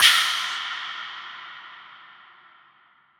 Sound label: vocal exhale percussion wet